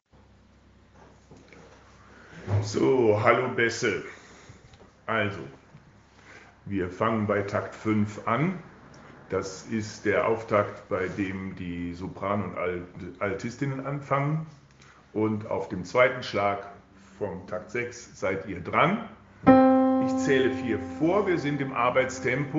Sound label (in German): Bass Arbeitstempo